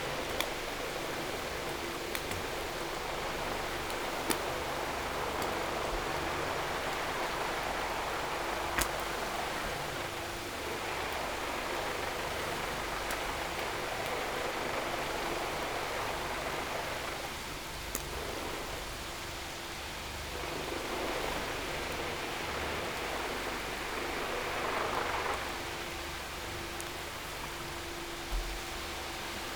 No Ship, helicopter

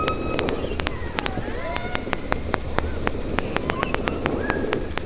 chatty orcas

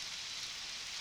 Background Noise

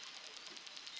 Background Noise Clips

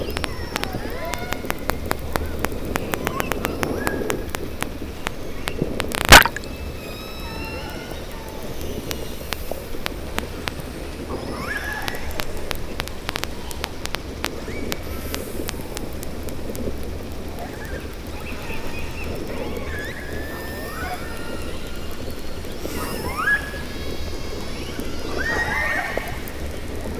sperm

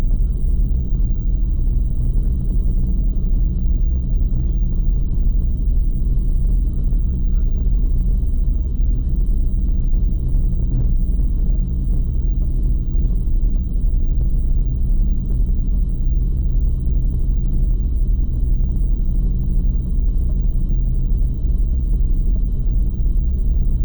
gate motor

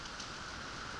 Background Noise

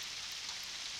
Background Noise

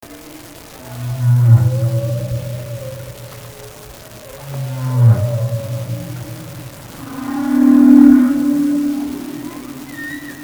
humpback Song

